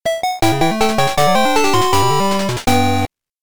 Fanfare!

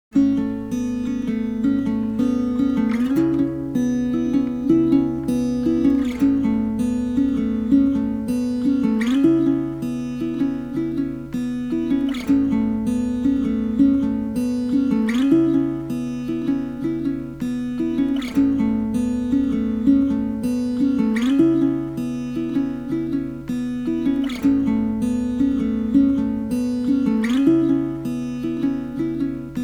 instrumental

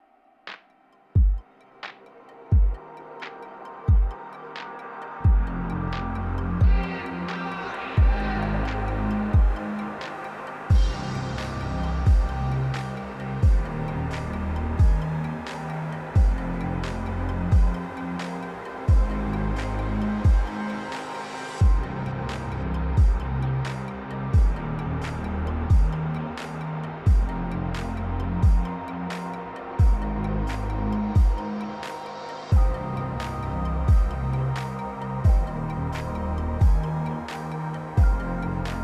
Warm Up Workout Soundscape

